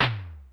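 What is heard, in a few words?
Electronic Floor Tom, Yamaha DD-35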